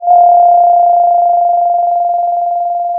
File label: pop, Sound of a phone ringing